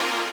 lead

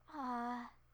Uhh; Alice

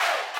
crowd

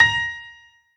piano echo

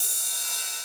ride

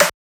snare1

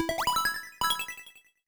Boot Caution; 主播女孩重度依赖

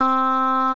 "a" C5; 音MAD; sonia

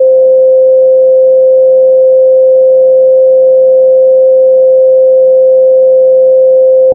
C sin; 锤子

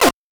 synth

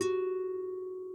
harp

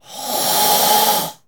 SE GAME SLIDE; maimai

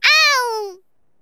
SE GAME BREAK; maimai

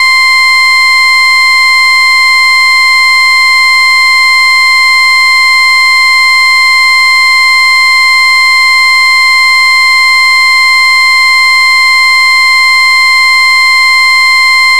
Ring bell; 音MAD; 蓝蓝路

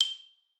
xylo

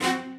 strings

